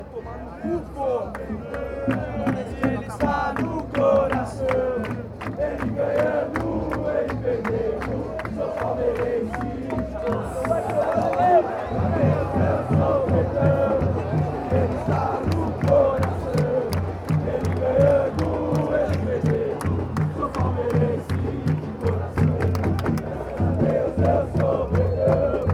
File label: coracao, chants